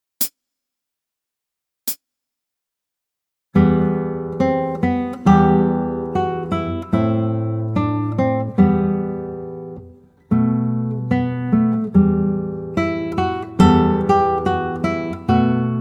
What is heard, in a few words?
Romanze, Gitarre; Mertz; Play Guitar Gitarrenschule 2